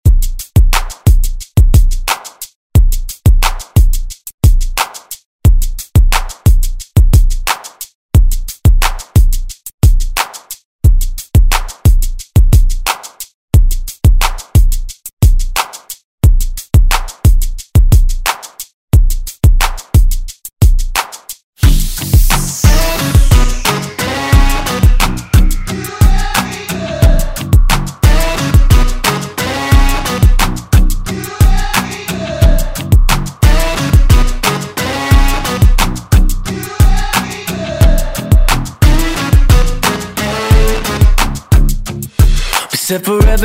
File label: Top40 Redrum